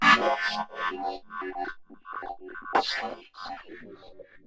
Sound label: Ring Mod, Cymbal, contact mic; Murf & Ring Mod